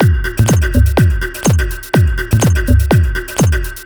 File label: G 124bpm, House Drumloops; 90 s Sample CDs; Zero-G House Fabrik Drumloops; ZG Drumloops